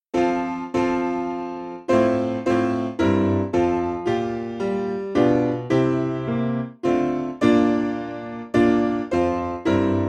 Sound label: Marienlyst, Piano; Captian Of Israel